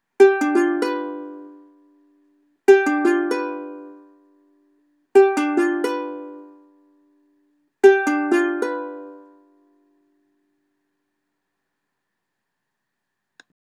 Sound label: g7 chord